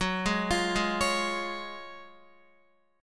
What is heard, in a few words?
F sharp Major 7th Suspended 2nd, F sharp, down, Guitar; arpeggiated; JGuitar